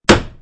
rope cut

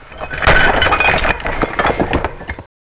shutdown clatter; ambience

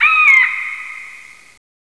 eagle

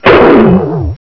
force field shutdown; ambience

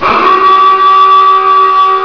siren; rebar

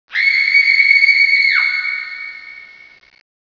f scream; ambience; rebar

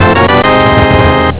dungeon chest music; zelda

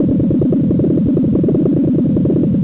alien; ambience

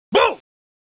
boo

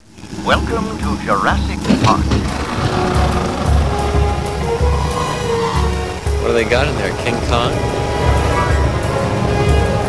doors; jurassicpark

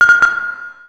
flag drop

